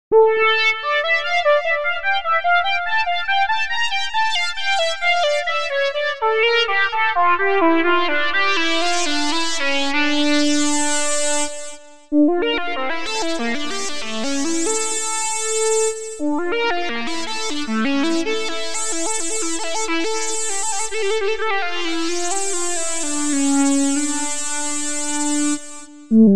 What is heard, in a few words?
moog lead; approximate the Minimoog 24dB filter; HV-Formant 1.1 mmoog lead1 preset